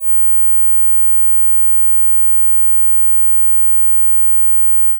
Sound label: 18k sine